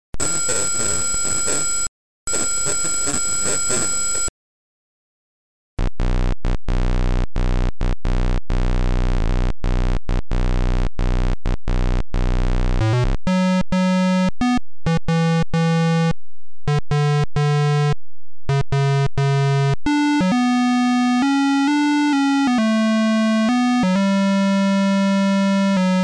cms, dosbox sound emulation

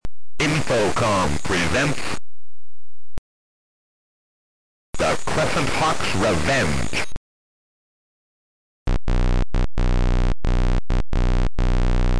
pc speaker, dosbox sound emulation

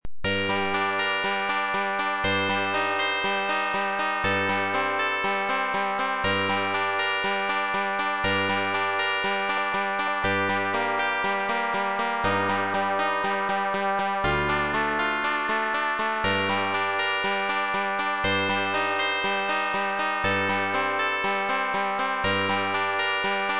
AdLib, dosbox sound emulation; ultima6

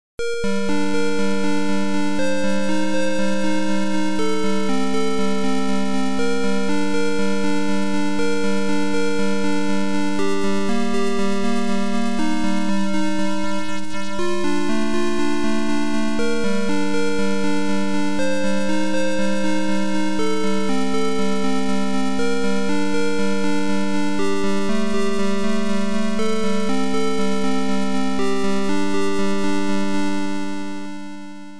tandy, dosbox sound emulation; ultima6

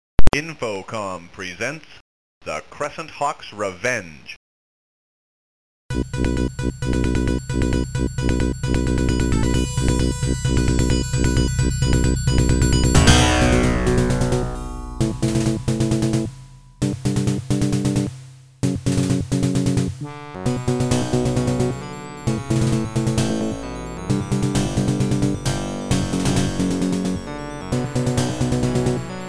soundblaster, dosbox sound emulation

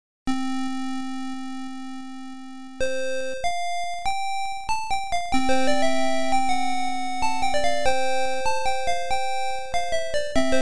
Tandy 1000, dosbox sound emulation

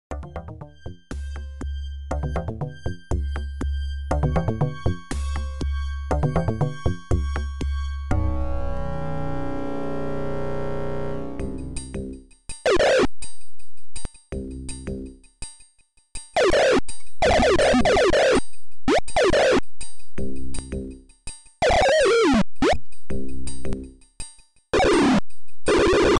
doom2 adlib pcspeaker

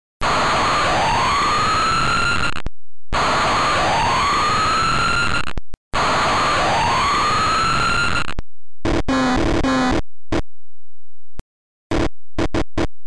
speaker, dosbox sound emulation; simcity

